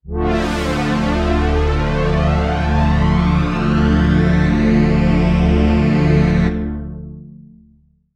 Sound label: Pad vintage Flanger; EQed